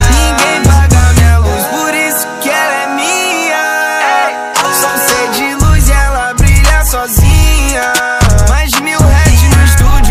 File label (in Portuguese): musica